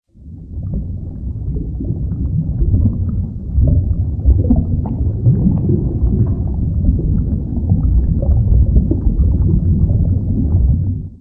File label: ambience; Barotrauma; Barotraumatic; Cthulhu